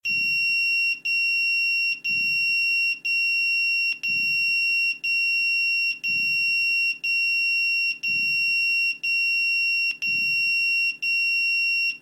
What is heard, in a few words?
motorola pager tone